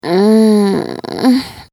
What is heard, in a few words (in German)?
stoehnen